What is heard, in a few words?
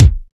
BD NEUTRAL; drum; BASSDRUMS